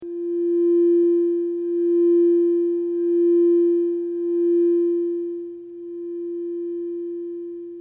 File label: crystal singing bowl tone f; chakra4